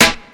clean snare single hit b key, steel snare drum tuned to the B note